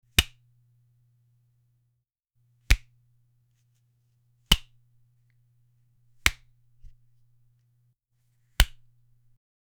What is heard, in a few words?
foley sound of a hand, object manipulation